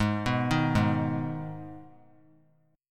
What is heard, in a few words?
G sharp Minor G sharp down, chord; G#m arpeggiated; JGuitar